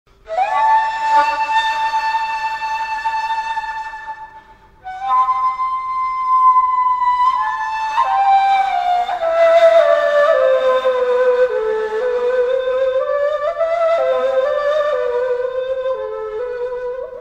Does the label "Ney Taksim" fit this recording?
Yes